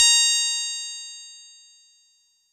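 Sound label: Fuzz Clav DX100 A#1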